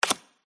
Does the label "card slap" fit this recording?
Yes